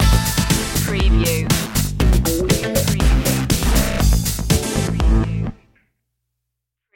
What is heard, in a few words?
party train stinger